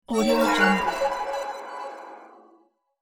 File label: dark crystal drown spell; game sounds; باطل شدن طلسم